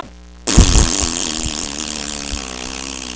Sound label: rasp